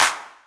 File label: Clap; M8; Electronisounds; Claps